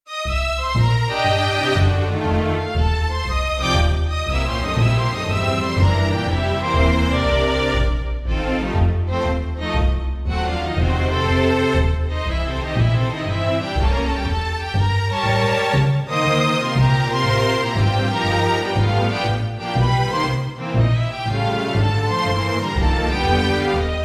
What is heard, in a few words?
strings